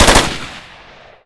smolder attack; boswars; apcs

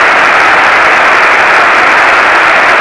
ice drag; linux-virtual-jay-peak; soulride

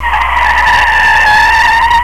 tire squeal; vdrift

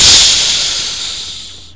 sizzle; blobAndConquer; combat

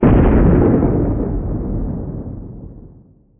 thunder

